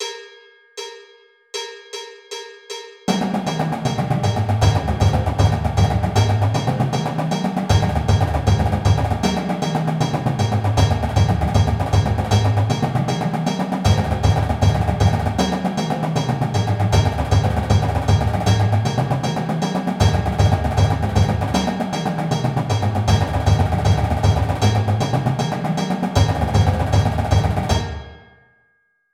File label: Triplet Diddle basses 156bpm